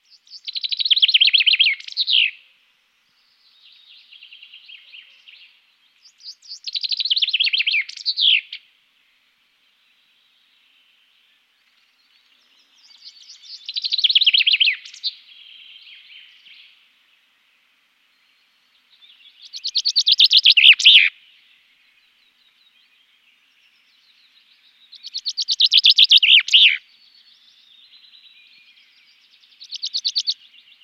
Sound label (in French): pinson des arbres chant